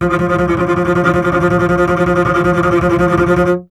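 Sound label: string contrabass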